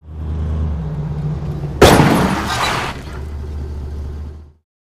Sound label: Drop Impact Moving Vehicle Collides With Stationary Vehicle, R To L